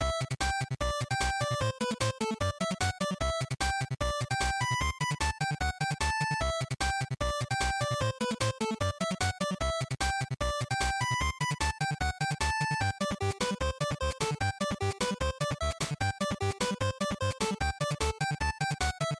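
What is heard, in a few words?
Game Boy Action Boss Loop, bgm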